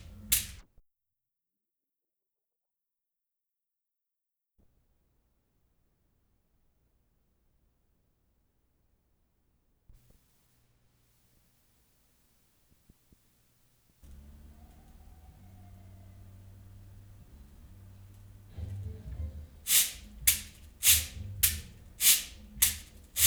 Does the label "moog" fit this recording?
Yes